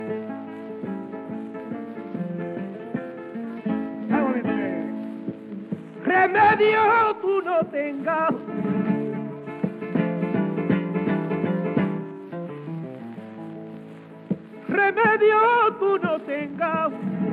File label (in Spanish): Solea, flamenco